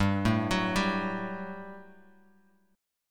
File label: G Major 7th Suspended 2nd, Bass Standard; GM7sus2 arpeggiated; 15,12,16,12